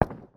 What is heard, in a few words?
ak dist; weapons